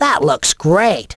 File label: Correct